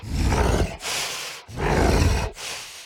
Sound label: Walk Cycle Burns; The Town